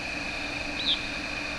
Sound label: Gray-cheeked Thrush; NY